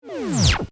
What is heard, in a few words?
Laser Gun Fire, Shot; Pass, By, Ricochet, Science, Fiction; Laser Sound Effects